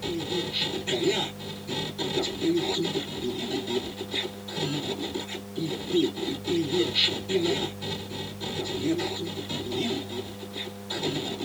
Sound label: TV; MiSide